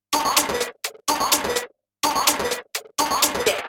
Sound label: toploop 126 fidget